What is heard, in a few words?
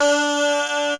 VOX; Zero G; Man Machine